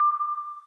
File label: timer; Samsung SGH-i200